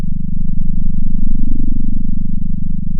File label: pop, sound of a small motorcycle